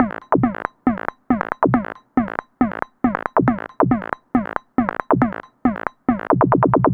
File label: Electronic Perc; Drums & Percussion; Percussion Loops